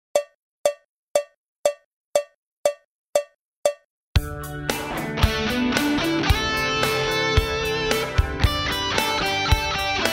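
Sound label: bridge high gain, voodoos; 2003 r9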